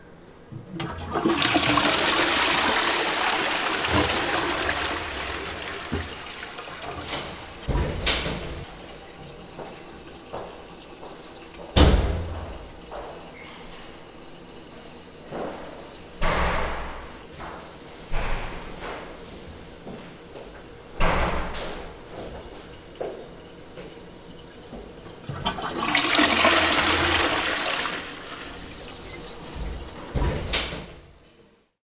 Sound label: public restroom; best service Blue Box; crowds interior